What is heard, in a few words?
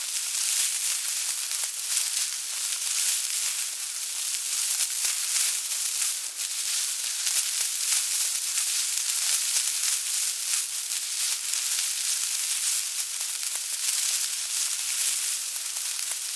foliage loop; Shu; ps vita ps4 switch windows